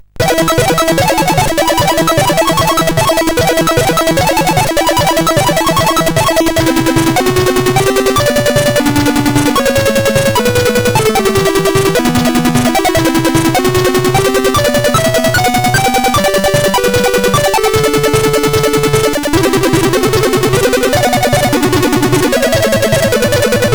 Intro, SID CSG8580R5

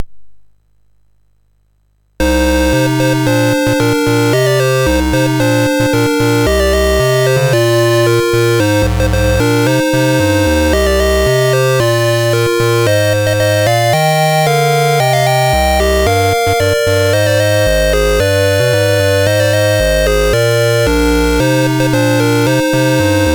Dragonworld sid MOS6581R4

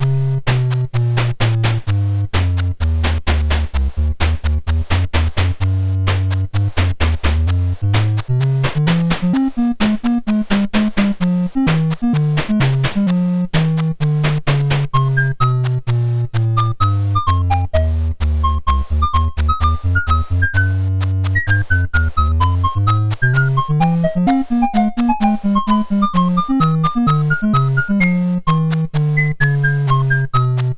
sid MOS6581R4, GAMES; Accolades Comics

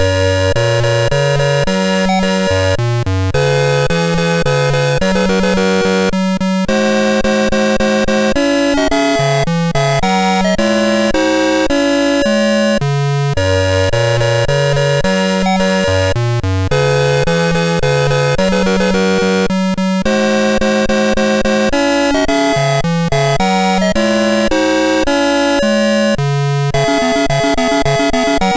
Menuet Don Juan, MOS6581

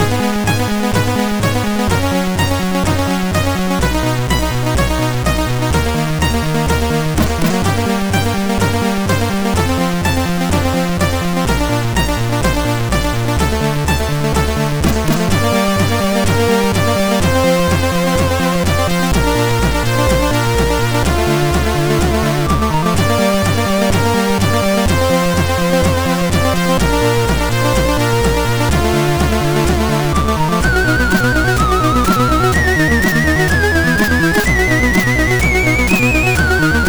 Tube Madness, MOS6581R4

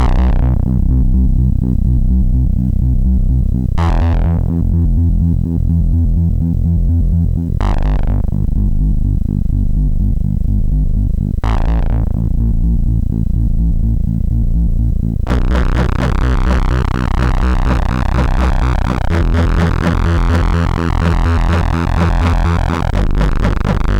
sid, MOS6581R4

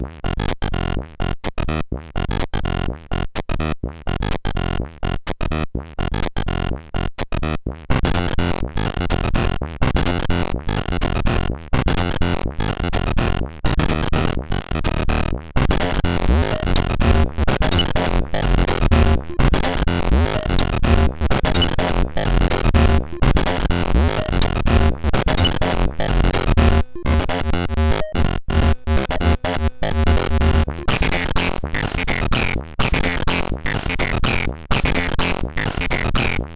Electric SID, CSG8580R5

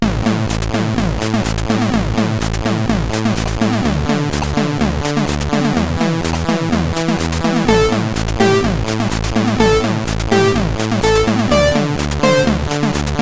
Beat Dis, sid CSG8580R5

